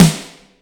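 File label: medium snare; Greytsounds VOL-1; PROCESSDRUMS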